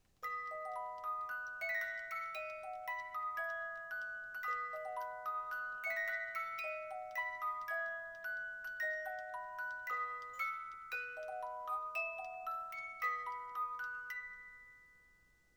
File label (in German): Klavier Beethoven